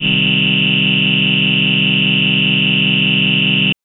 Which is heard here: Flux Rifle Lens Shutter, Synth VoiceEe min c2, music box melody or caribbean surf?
Synth VoiceEe min c2